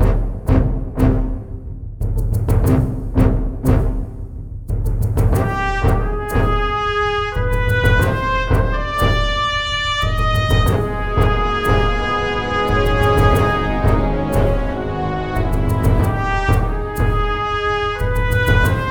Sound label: Orchestral Battle Loop